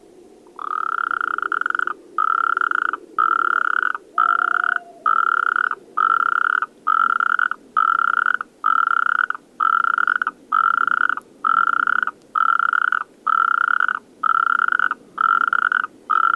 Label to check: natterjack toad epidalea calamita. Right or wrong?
Right